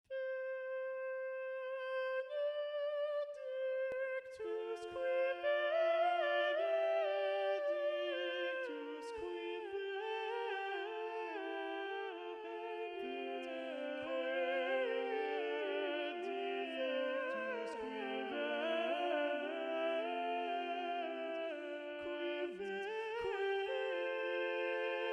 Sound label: missa brevis benedictus bass predominant, schola; palestrina